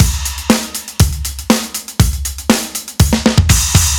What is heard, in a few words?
16th Beat B; Ударные и барабаны: 16th Beat B (Rock Alternative); 120 BPM; Drums; рок